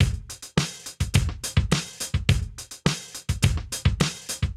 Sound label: Drums Proc 105 bpm, funk soul; Beats